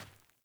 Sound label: Sandals Run Dirt, Footsteps; Characters; Player